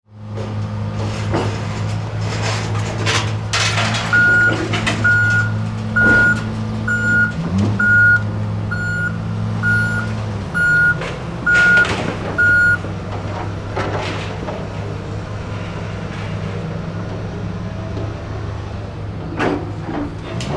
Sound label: Truck crane moving and lifting